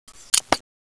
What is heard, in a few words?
widow deploy, knife; cstrike; csgo